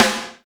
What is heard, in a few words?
airy sizzle rock snare drum sound g sharp, Royality free acoustic snare tuned to the G# note